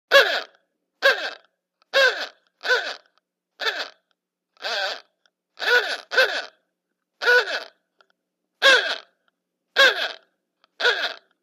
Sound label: Old Klaxon Car Horn, antique horns